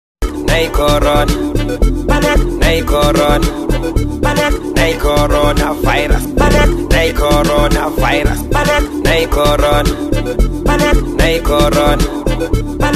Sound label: Amapiano